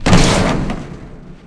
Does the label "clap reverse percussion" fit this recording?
No